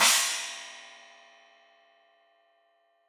China, Crash, China Cymbal; Deeply Sampled Drums; SMD Cymbals Stereo

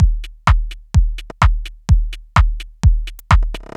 Big Kicker, Drum Loops, 127 BPM; Sonic Foundry (Sony Creative Software); Underground UK House & Electro

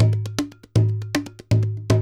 120, MIXED DRUM LOOPS 120; World Instruments 2; WORLD DRUMS

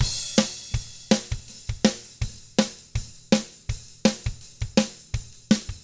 ROCK; ILIO; Double Platinum Drums 1; 164 ROCK

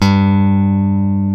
BASSES, Roland

